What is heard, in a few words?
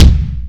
drum hit clap